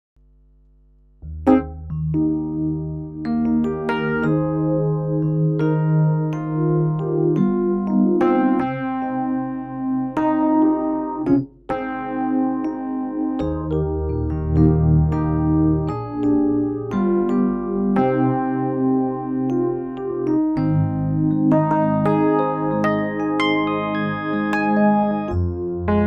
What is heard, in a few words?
Kurzweil EP; pc3